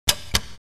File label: button, knappar på - av-ljud